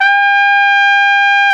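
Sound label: BRS AKG TP, Brass Trumpet p; Roland L-CDX-03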